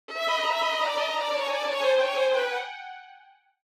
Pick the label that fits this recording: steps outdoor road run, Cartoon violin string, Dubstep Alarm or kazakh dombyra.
Cartoon violin string